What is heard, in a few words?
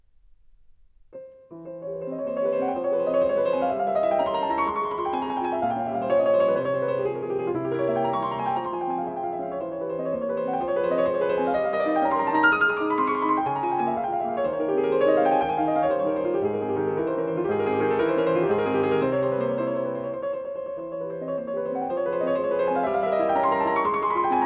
Etudes for piano, F minor, Presto; Chopin; Etudes op 10 25